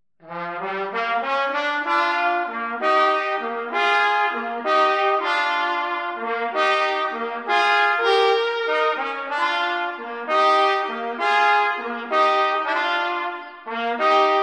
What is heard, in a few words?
slow blues